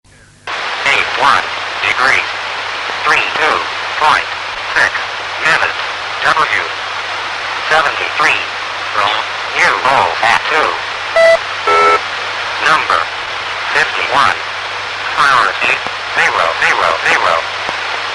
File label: digitalker; histoire